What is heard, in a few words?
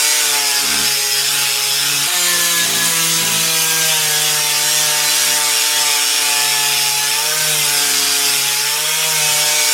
cut; crate